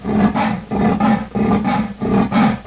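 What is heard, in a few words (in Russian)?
звуки гепарда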